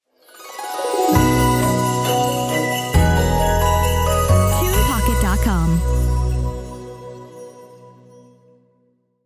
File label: Christmas Spirit Intro; TunePocket